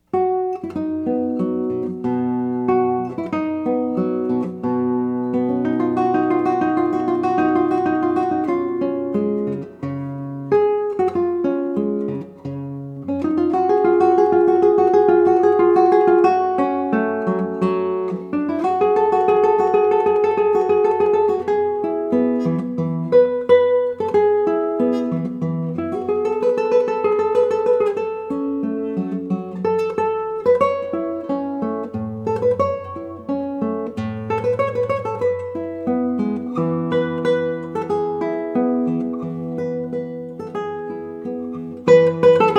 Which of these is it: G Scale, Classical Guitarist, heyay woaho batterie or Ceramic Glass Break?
Classical Guitarist